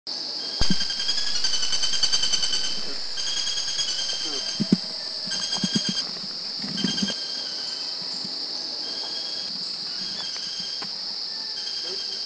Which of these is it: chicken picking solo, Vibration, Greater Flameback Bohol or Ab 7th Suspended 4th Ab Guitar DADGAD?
Greater Flameback Bohol